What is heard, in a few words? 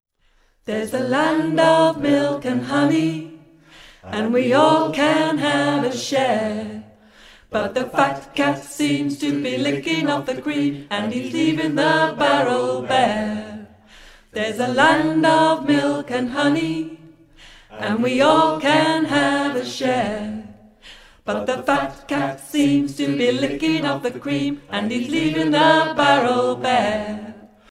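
tenor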